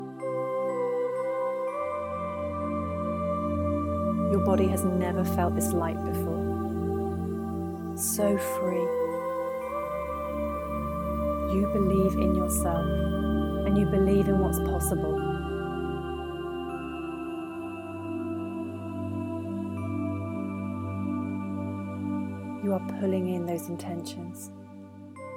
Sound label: Full Moon Meditation